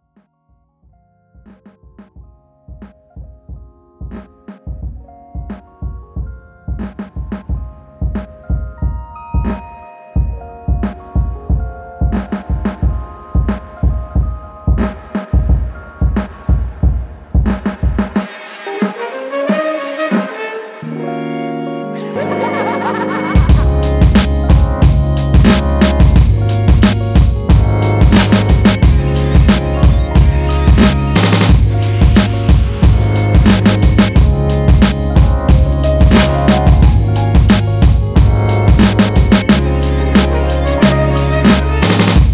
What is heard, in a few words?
Lo-Fi Synth Version